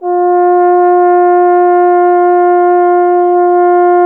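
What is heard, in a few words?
brass bass trombone F; Miroslav Vitous; Solo Instruments VOL-1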